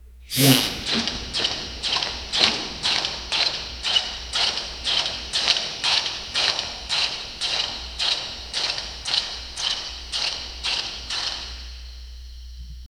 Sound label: hostile quadruped robot, clinks